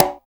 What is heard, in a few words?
HRDCGA SLAP; Drums In Your FACE!